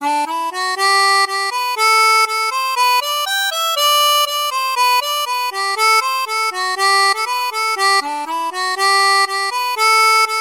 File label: Morris Dance English Folk Dance Harmonica; Natural; Akkordica; Syntheway